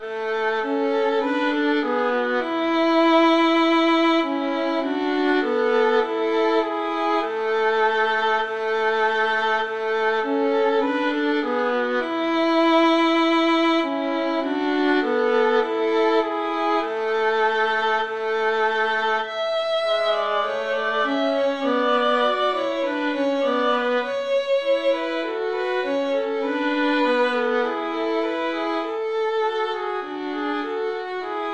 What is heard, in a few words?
ding dong violin duet